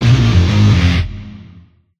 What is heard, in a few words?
stinger time; Goat of Duty